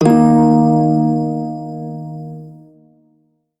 Prime Shawzin Chromatic Chord, Music; Warframe

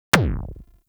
Arch Gun Primary Beam Punch; Weapons; Warframe; Archwing; Hildryn

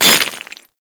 Melee Impact Cement

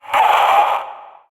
Possessed Red Veil Vocal Pain; Warframe; PriestQuest

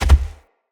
Gallop Default, Animals, Foley, Steps; Bromius

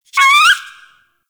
Rabbit Ambient Call; Warframe; Duviri

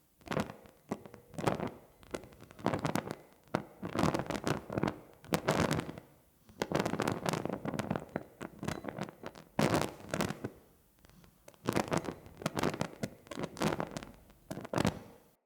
Candle Burn Loop

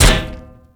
Platform Debris; Warframe; Tau; Circles Of Hell

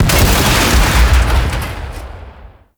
Arachnoid Camper Leg Explosion; Warframe; Corpus

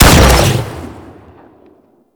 Bio Weapon Fire 2D; Warframe; Weapons

